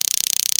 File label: tazer, stungun